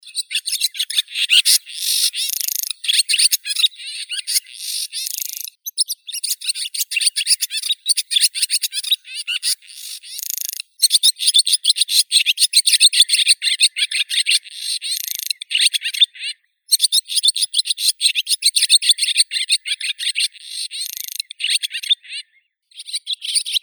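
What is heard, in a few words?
barn swallow, Hirundo rustica; lastochka derevenskaya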